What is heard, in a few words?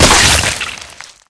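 gib splat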